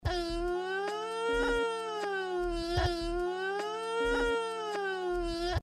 siren

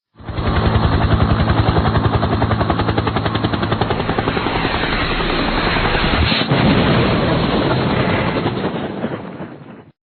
helicopter crash sound effects

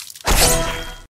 combo; fruit ninja

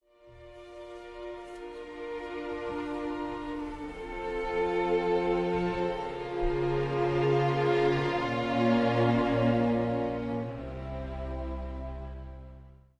prayer music

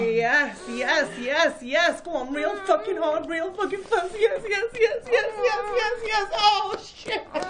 moan yes yes yes

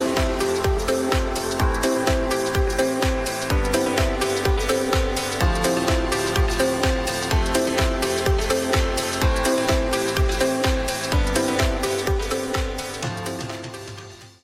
elevator music

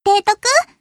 alert; zuihou k3c

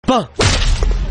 bang

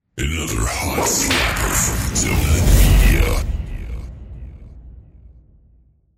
Slapper

